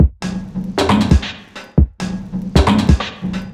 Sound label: Garage Room 135 bpm, drums; Loops; Ableton Live 12 Lite; Core Library